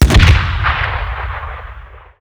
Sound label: air burster explode, weapons; tf2classic